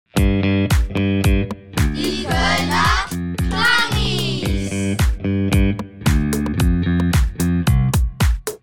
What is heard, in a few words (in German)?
Jingle; Koelner Klangies